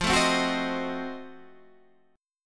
F 7th Flat 9th F down Guitar Standard; F7b9 strummed

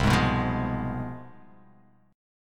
C Major 7th Suspended 4th Sharp 5th, down

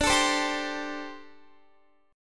D 7th Suspended 4th, down, Guitar

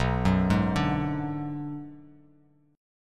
B Minor 6th B; Bm6 arpeggiated; JGuitar

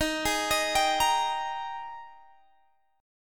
Ab Major 7th Suspended 2nd Eb

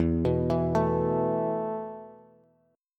E Suspended 4th E, down, Bass; Esus4 arpeggiated

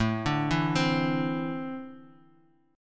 D 7th Suspended 2nd, chord

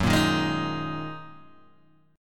F sharp 6th Add 9th F sharp Guitar Open G

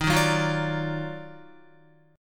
Eb Minor Major 9th Eb down Guitar, chord; JGuitar

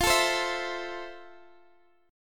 F Major 7th F, Guitar, Open G

